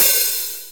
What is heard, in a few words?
airy open hi hat sound g sharp key; open hat samples